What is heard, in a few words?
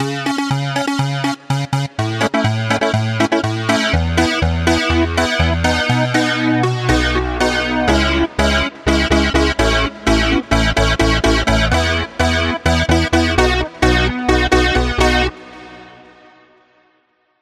break